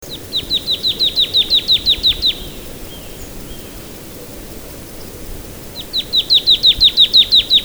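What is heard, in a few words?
P pal song (background P ater song)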